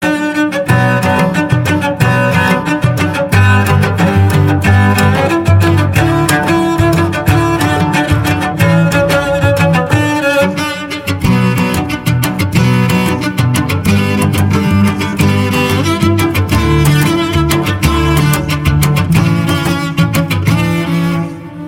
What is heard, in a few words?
cellos